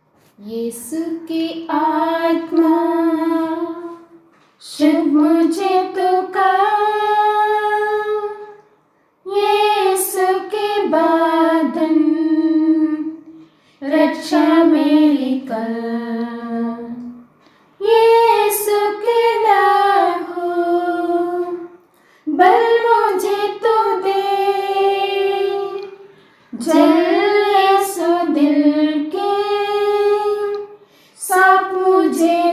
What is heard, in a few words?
anima christi hindi